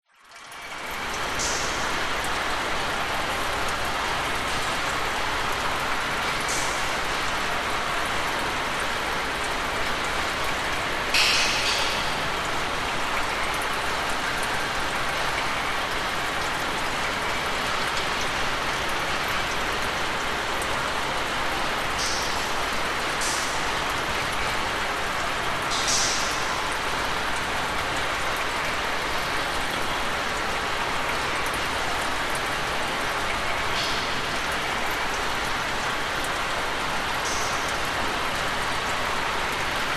industrial warehouse abandoned rain metal roof leaking drips gutter